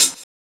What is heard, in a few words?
CHH, DRUMSAMPLS, Disco Fever; Best Service ProSamples vol.48 - Disco Fever; AKAI